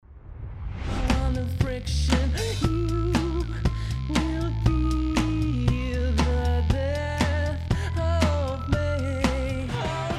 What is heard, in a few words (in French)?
imitations chant; accents imitations